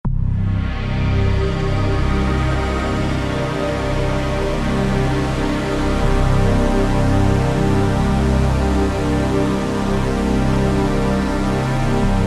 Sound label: music space; Ioncross Total War